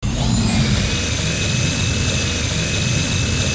ATTACK; 1997 MMX LAN PACK (win 95)

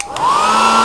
Dryer On; 1997 MMX LAN PACK (win 95); Army Men

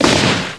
Speeder Grenade Launcher; 1997 MMX LAN PACK (win 95); Army Men

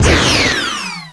medium laser; 1997 MMX LAN PACK (win 95); Army Men

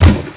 Rover Mortar; 1997 MMX LAN PACK (win 95); Army Men

